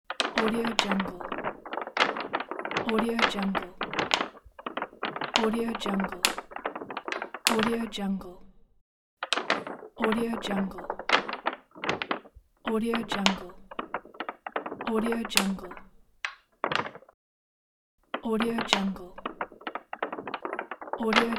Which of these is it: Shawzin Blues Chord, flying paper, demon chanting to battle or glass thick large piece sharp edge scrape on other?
flying paper